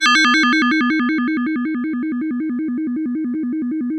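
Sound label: FM Bell FX DX100 C#6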